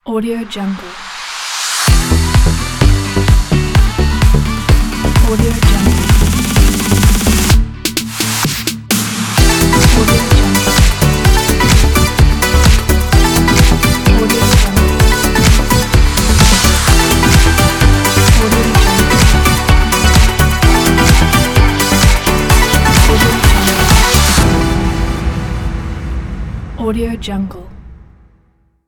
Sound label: summer pop logo; audiostory